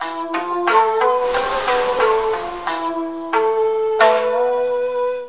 Battle Lose; Meow Country Story; Android

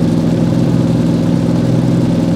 Dodge Challenger High; car simulator 2

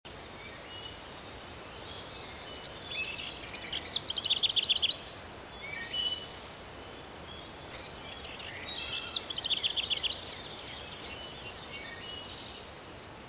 ambient loop; crazy chicken

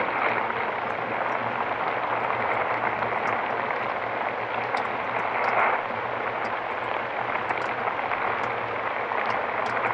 water roof interior loop

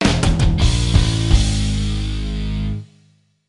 Sting Get Power; X-Men Origins Wolverine; NDS

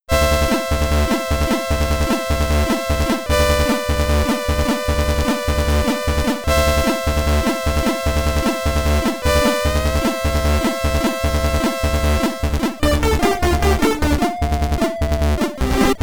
Agressive World (SID Stereo), commodore 64; Rastan

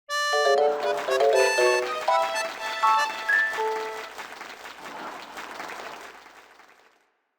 Fly Swatter (Level Clear)

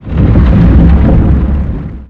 Sfx Colossus Movement

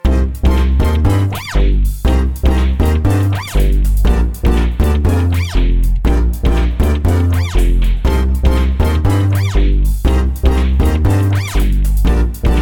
danger funny; Identity V

